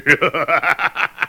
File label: man